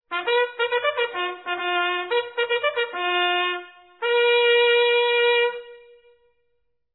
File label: Orderly Serjeants, bugle calls